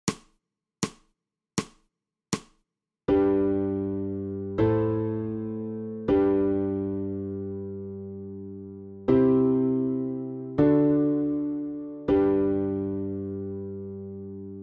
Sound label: SOPRANO